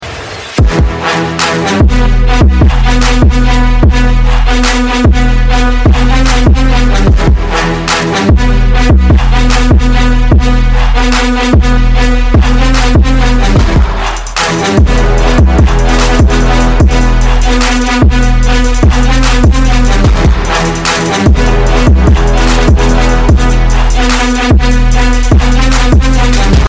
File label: trombone flip